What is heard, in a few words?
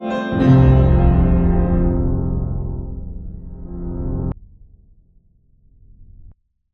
grand piano keys